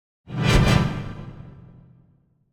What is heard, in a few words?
Symphonic Hit Fast Double Final Chords - Lower (brass); Hollywood Edge; sound designer's toolkit v2